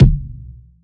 long tail reverb kick drum c key

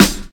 sizzly steel snare drum D# key; snare drum samples

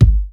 low kickdrum sample g sharp key

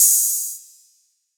snappy open hi hat one shot g sharp key

